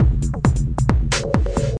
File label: techno, drum loops and breaks; 133 bpm; 131 to 140 bpm